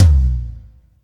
Tom; osu

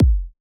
normal hit whistle

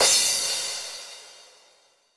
soft hit finish; osu

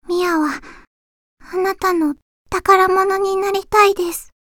applause

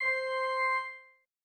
organ

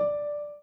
Piano Chord

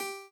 chord g

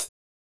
soft hit normal

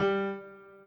piano intro

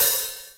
Hat Open; osu

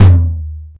Tom Normal

